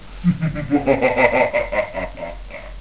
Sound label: evil laugh